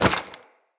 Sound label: Loot Bag Drop